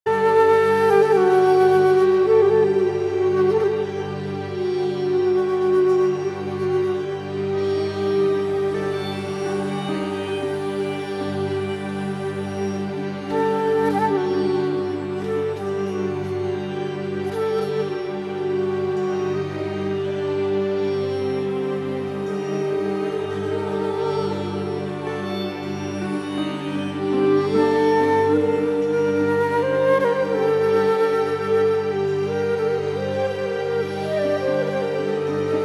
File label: Bells, Raga Kervani